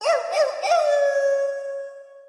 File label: wolf howl